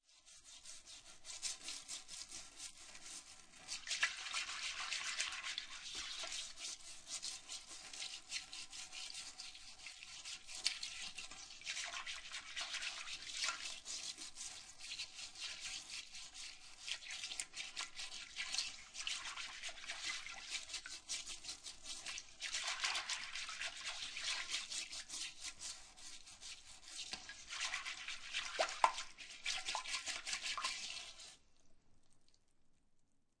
Toilet Brush Brushing, Household, Toilet Brush Brushing Inside Of Toilet Bowl; Bathroom